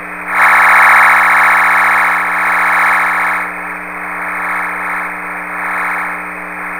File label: fsk441